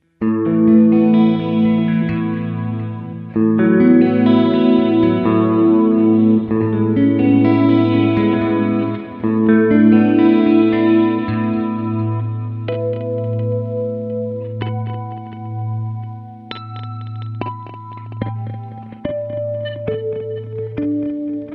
ev chorus test

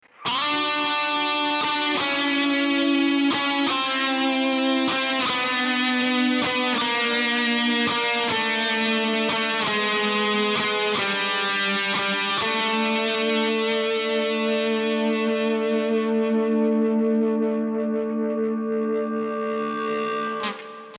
custom shift

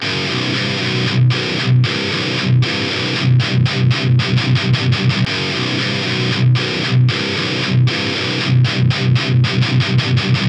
Triaxis Lead1 Red (no fat mod) (stereo)

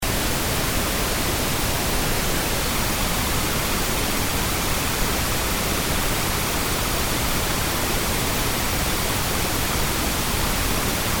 pink noise

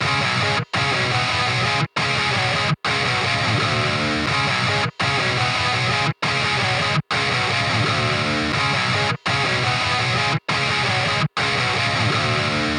Tube Screamer Engl Fireball (stereo)

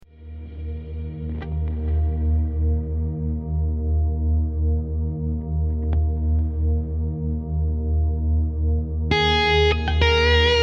drone

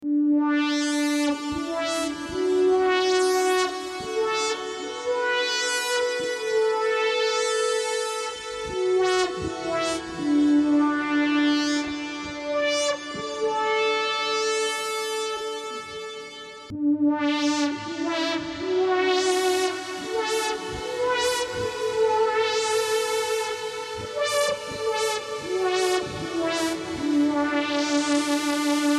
synth test

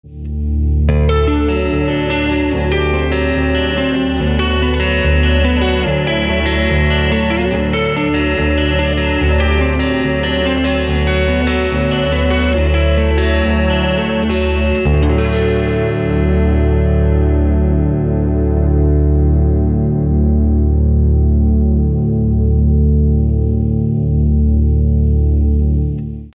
sweep flanger

